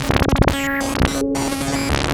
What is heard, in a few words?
LFO, Noise